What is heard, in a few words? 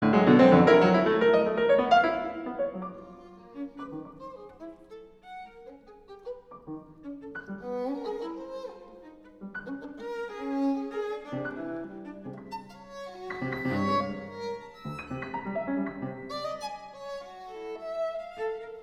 quintet for piano string quartet